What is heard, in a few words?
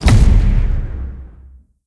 Fall; blue archive